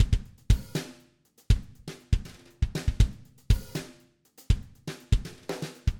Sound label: felt brushes, drummer; toontrack superior drummer